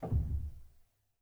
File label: rel, Grand Piano; bitKlavier Grand; Piano Bar